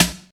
prominent snare drum sound a sharp key

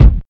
solid steel kick drum sound g sharp key

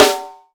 prominent snare f sharp key

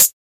natural closed high hat sound A key; Duration 0:01; Channels Mono; hi-hat

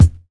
old school mid heavy kick drum sample e key

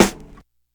big snare single hit c key

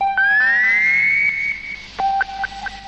ECHO BLEEPING

